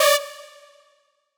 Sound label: synth